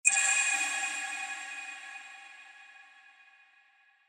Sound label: logo repulse